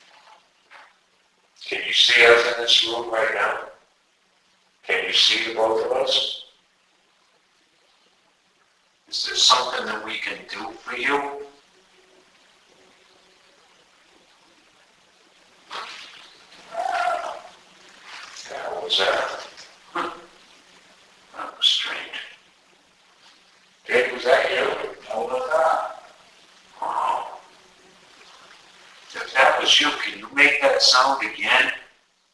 House Scream; King House; KING HOUSE SCREAM - "NO"; VOICE ISOLATED

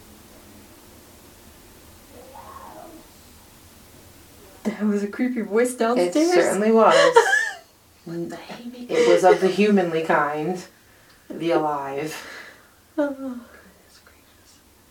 2nd floor Scream; SCREAM FROM UNKNOWN AREA

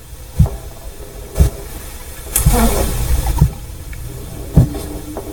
Footsteps with voice; 3rd Floor; iso with voice; FOOTSTEPS AND VOICE; Lizzie Borden House

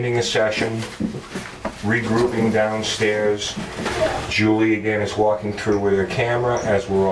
Chair

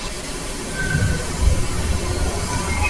Hall whistle response; at 30 seconds; WHISTLING RESPONSE; SOUND ISOLATED; eerie whistling response with absolutely no visible source of origin

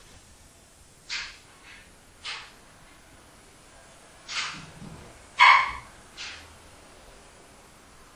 Sounds close to recorder in kitchen

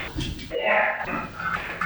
Firetruck Response

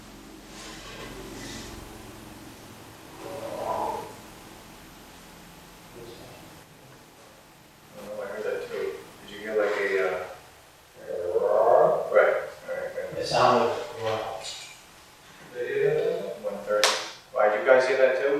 2nd floor - growl sound; growl sound at 5 seconds; MALE VOICE

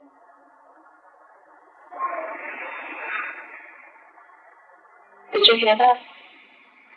Corner Office Lot of people

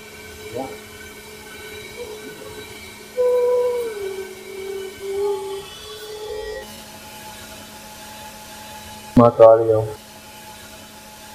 Basement Jail - Female Hum; Middleboro Town Hall; WOMAN SINGING

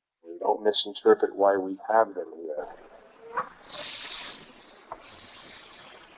Bedroom Laugh

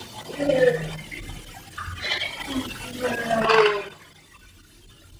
Foghorn Room Amped; Rose Island; VOICE ISOLATED; “KEN (?)…..KEEPS ME IN THE ROOM”

